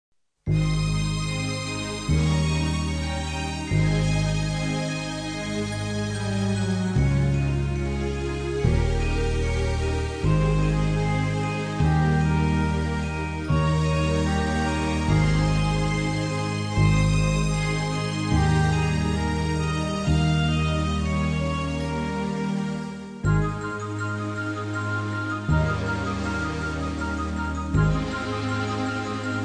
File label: INSTRUMENTAL